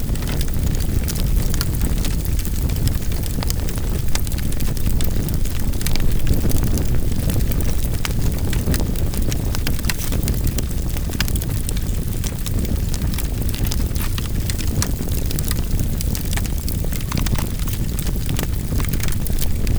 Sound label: Fire Loop